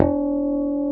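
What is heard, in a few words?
MULTIPHONIC D4, FRETLESS BASS; Northstar - New Gold VOL-2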